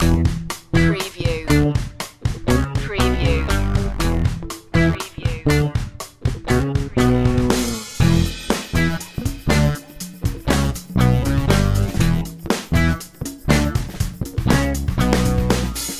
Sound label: funky strut loop